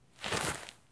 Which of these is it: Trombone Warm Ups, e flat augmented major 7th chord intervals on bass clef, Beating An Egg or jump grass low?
jump grass low